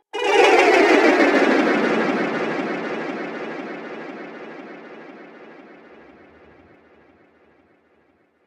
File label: Fantasy Magic Slow Time Spell; Fantasy Magic - Slow Time Spell 04; Magic Sound Effects